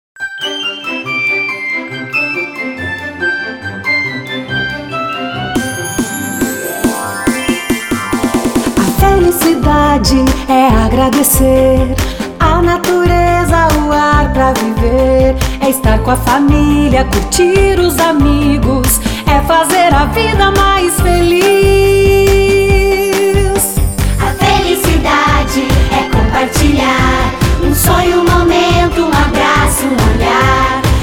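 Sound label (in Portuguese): pop voz feminina; ORTOPE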